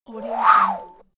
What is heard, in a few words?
swoosh roll